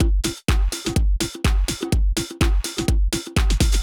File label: DRUMLOOP HOUSE 125; eJay